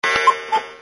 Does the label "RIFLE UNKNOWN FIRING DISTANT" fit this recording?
No